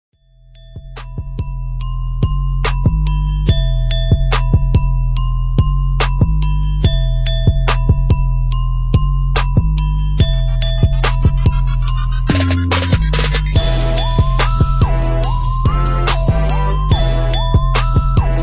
instrumental

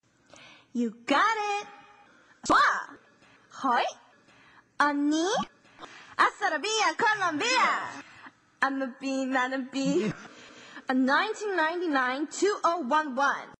Is Calling Rap, ringtones

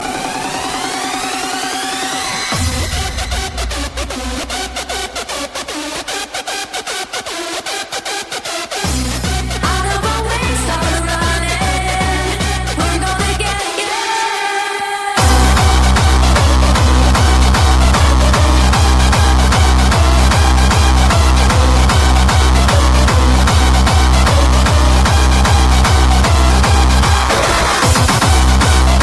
Hardstyle

